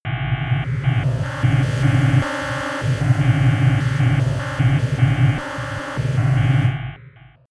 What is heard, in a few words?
atmosphere on off